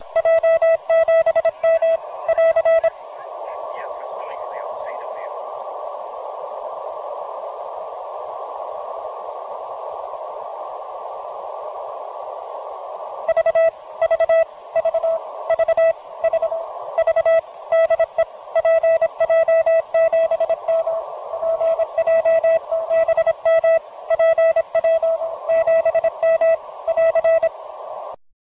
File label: beacon